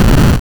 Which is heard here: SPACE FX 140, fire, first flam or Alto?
fire